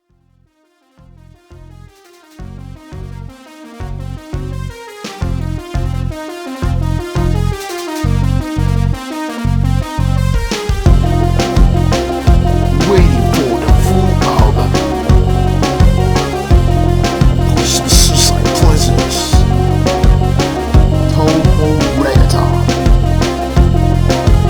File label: Reggaeton; Touhou Reggaeton Fake Trailer